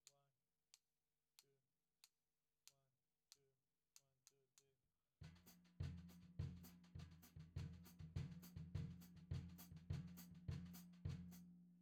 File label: Floor